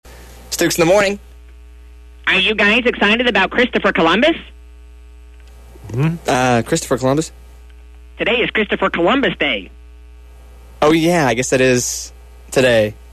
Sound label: scream